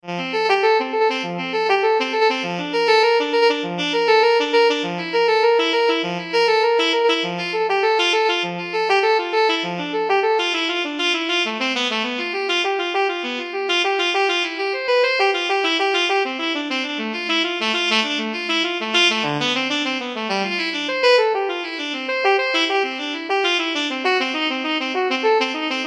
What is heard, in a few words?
cello suite, saxophone; bach